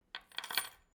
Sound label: Keys On The Table